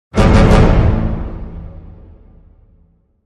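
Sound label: Hit Orchestra Tutti Triple A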